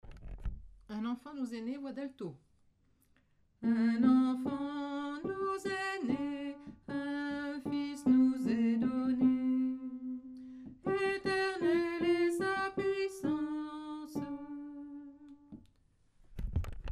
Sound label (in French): alto; un enfant nous est ne